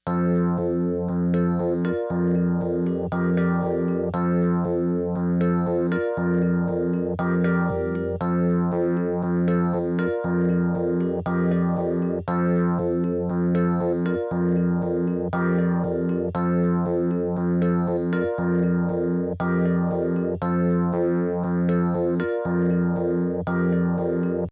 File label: logic phaser